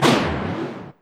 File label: fireball